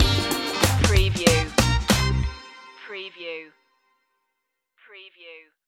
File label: forever funk stinger